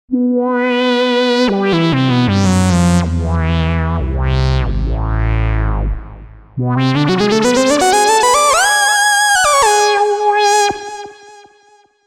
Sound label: Reso Saws; TURBO VL